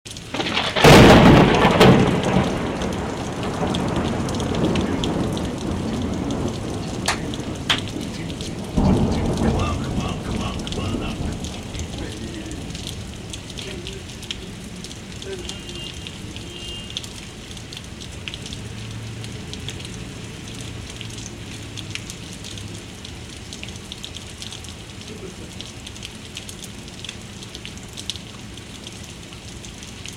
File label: rain